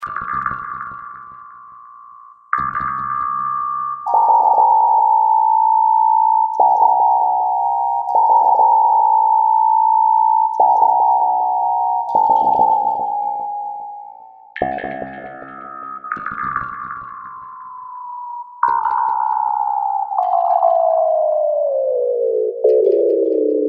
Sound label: Kore FX Bass